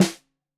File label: Snare 65 Reg Stereo, Deeply Sampled Drums